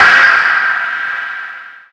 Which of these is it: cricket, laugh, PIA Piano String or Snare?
Snare